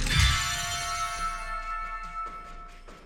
scatter Win; netent

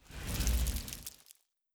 quick stop bounce; netent

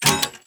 spin Button

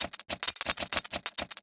special reel flash; netent

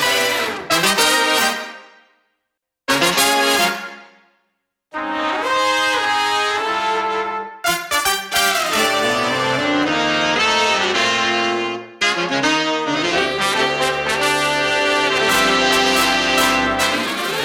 cash stack brass; netent

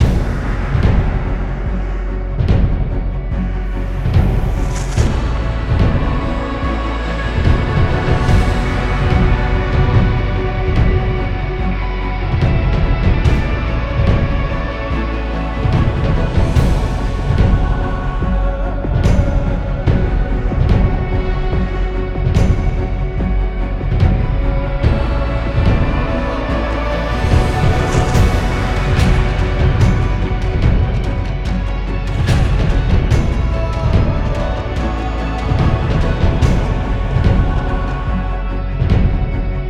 free spin; netent

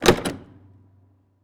Quickstop; netent

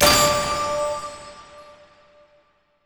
small Win; netent

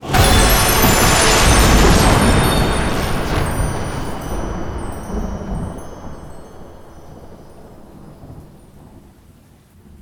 epic Win; netent

